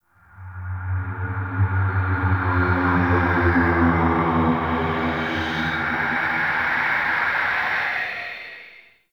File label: Ghostly Whoosh A; Ambience; Warframe; Man In The Wall